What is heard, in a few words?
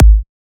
heavy